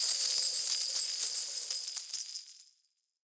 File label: coin Loop End; gorillakingdom